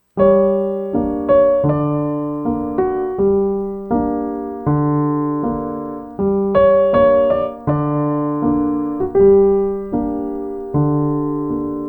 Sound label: piano, 80 bpm